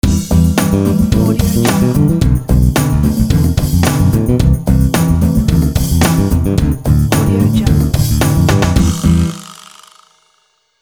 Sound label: soul funk logo